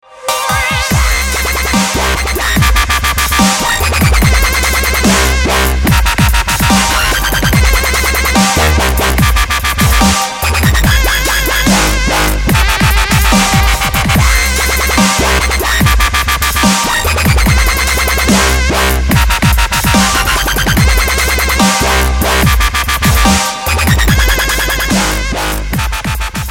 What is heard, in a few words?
dubstep machinegun